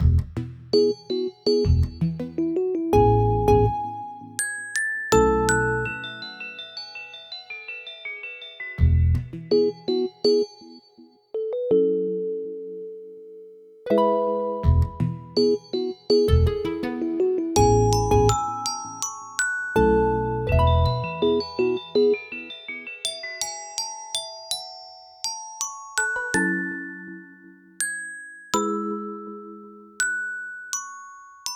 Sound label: Rainy